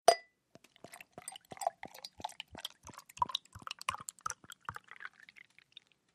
Beer Bottle Pour Glas; eating & drinking

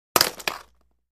Roller Blading; Single Roller Blade Is Dropped.

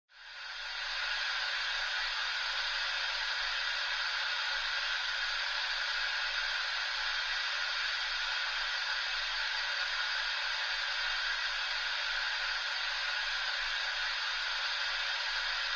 Gas Leak B Stdy Fuel L

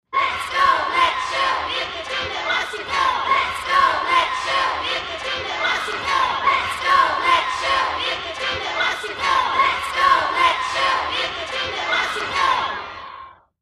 Cheerleaders Girls

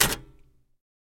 Typewriter Back Spa; typewriters

